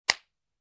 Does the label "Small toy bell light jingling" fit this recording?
No